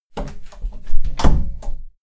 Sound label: closing door